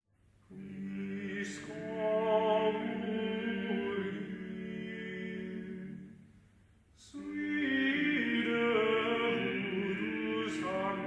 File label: polyphonic chanson; Guillaume de Machaut Puis quen oubli